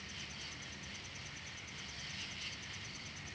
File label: NIGHT LOOP; Cars; XBOX